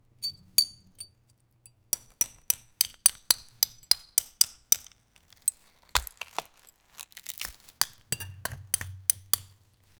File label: knife tapping the glass s